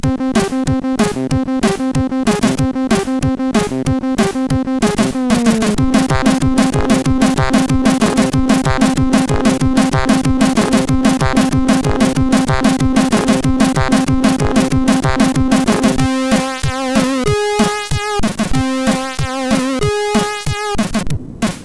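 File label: Techno, MOS6581R4; Techn0-2; hvsc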